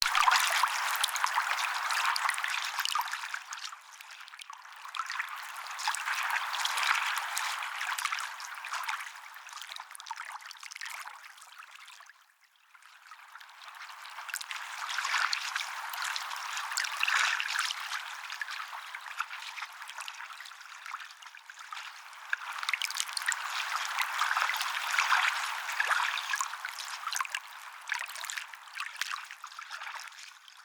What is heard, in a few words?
Eternium Water Laps; Eternium Garden